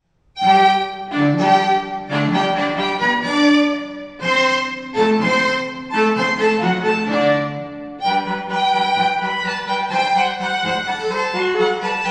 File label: strings; third floor strings; classical strings